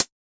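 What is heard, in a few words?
Hi-Hat Closed; Yamaha DJX-2B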